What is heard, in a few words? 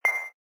notification; cep telefonu melodileri ve bildirim sesleri